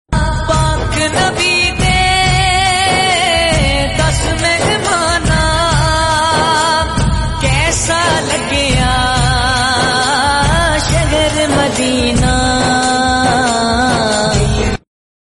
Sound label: Kashmiri naat Islamic